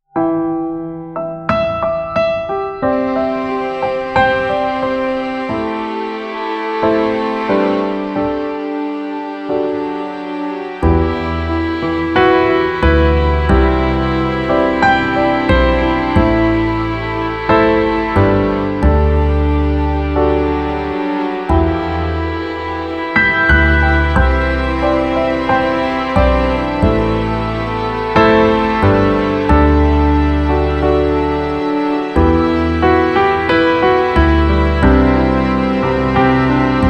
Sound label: Tranquil Nocturne; admiralbob77